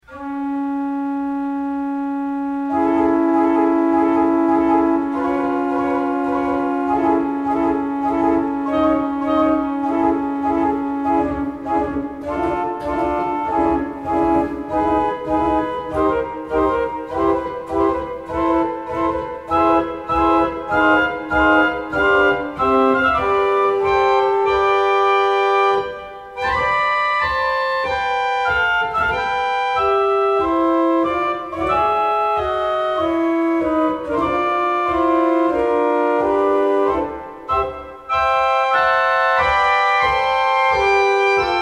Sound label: Salicional